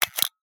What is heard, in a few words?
old camera shutter sound